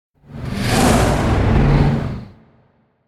Whoosh Large Heavy Flying Away; Hollywood Edge; sound designer's toolkit v2

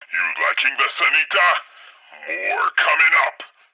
pre reload; Blind Drive

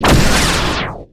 Pickup Scifi Energy; SFX Kit

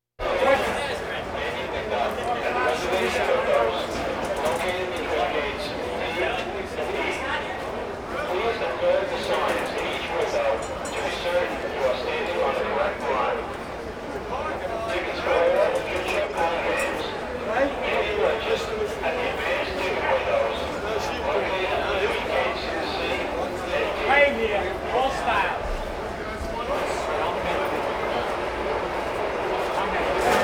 Crowds Race cars Baseball

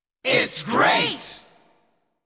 It's Great!, jingles

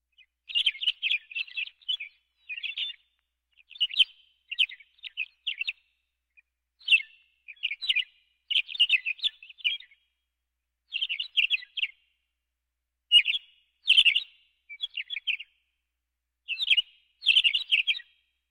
Birds

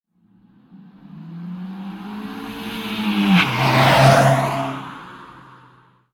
car; Blind Drive

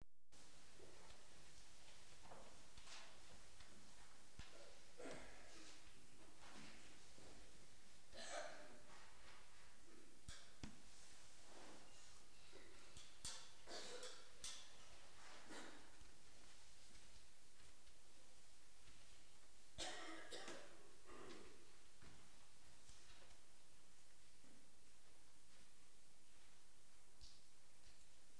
Audience Applause; Valentino Production Sound Effects Library; Office sounds

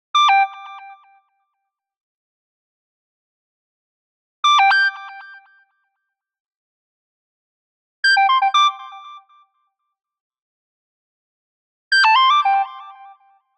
Arcade Jingle Positive

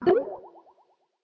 INCOMING CONTACTS; skype

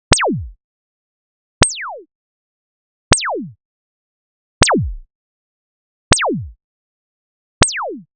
LASER Basic Sweep; boom library; Anime Essentials

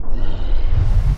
Punch Left Speed Up; Thug; Pitch Black - A Detective's Demise

